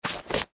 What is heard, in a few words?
Clothes Hand Brush Jeans Wipe; Brushing; Foley; Blastwave